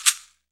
CAXIXI GOURD, Latin Hand Perc; E-MU Producer Series Vol. 2; More Studio Essentials; Composer

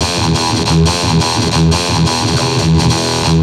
Treat Guit, Industrial Nasty

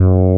Fretless F#1; Proteus 2000; Digital Sound Factory Proteus 2000

